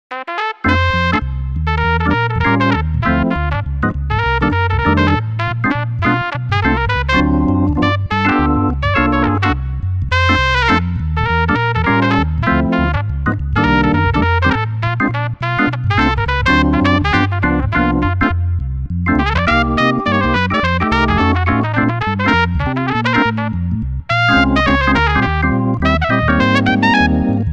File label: solo trumpet